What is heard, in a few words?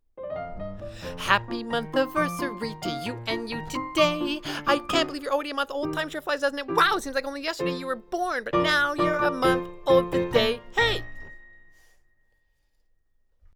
Piano; My Little Pony Friendship is Magic; Season 2